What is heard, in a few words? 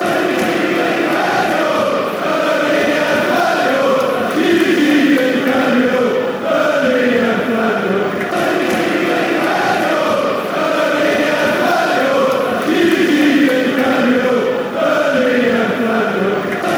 fanchants; g g de canio bernie and flavio